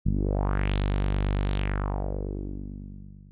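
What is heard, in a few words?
envelope filter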